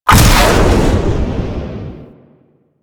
Jetpack Melee Launch Up; Warframe; Veilbreaker; Sprag

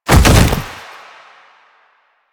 Bodyfall Light Default; Warframe; Lasrian; Lasrian Soldiers; Foley; Body Falls

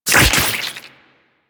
Gore Spew; Enemies; Warframe; Infested; Grey Strain; Splitter

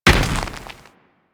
Moa Step Dirt, footsteps; Enemies; Warframe

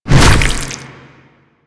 Proto Infested Ancient Tentacle Swing Hit; Enemies; Abilities; Warframe

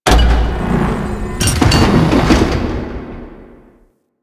Corpus Ship Metal Mech; Ambience; Warframe; Corpus Ship Remaster

